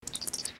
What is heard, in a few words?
chirp clean; Business skieme Beta 1